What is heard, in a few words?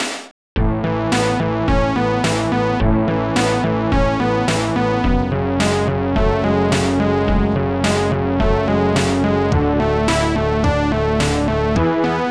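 keygen song.mod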